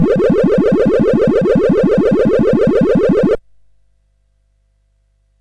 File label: Synth Sample, CSG8580R5